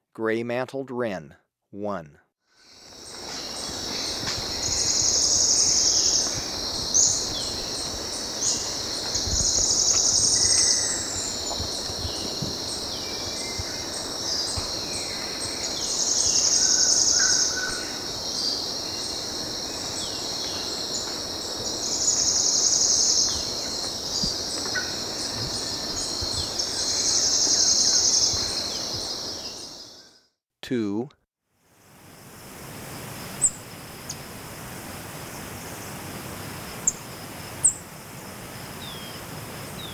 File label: Gray-mantled Wren, Birds of Ecuador; S. America